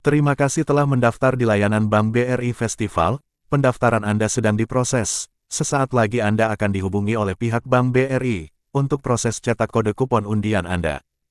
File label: music